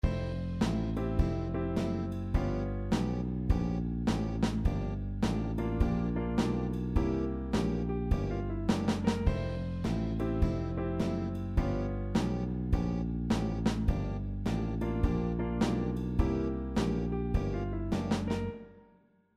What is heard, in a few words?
Bandpattern Swing; Popularmusik